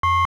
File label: Sci Fi Beep